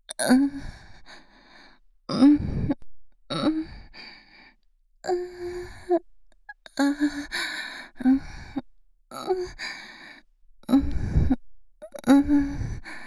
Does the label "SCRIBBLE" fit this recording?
No